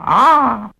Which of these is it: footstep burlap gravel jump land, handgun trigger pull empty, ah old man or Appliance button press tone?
ah old man